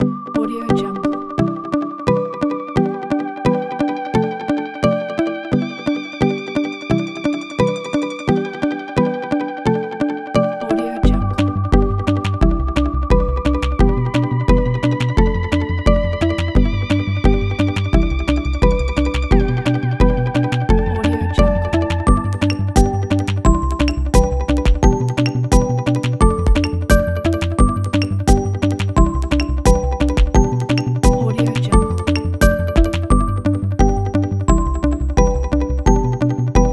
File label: positive rhythmic electronica